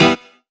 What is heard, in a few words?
Synth Shot E